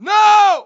hurt heavy; cj

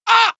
hurt med; cj

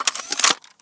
mach boxin; weapons, bianachi

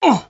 hurt light; cj

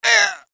death; cj

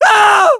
die; worm, bf2142

